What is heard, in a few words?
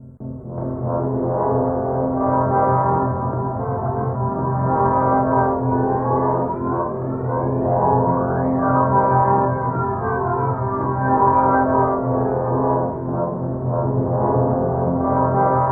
Time Trumpets F2; Zero-G - Ghost In The Machine